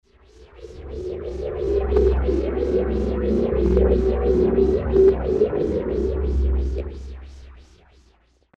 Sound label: scifi